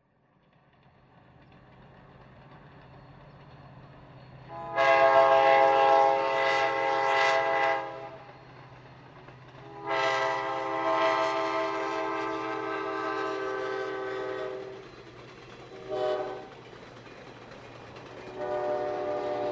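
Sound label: Train By Medium Distant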